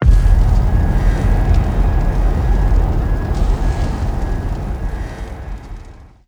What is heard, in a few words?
burning city; Stellaris; nemesis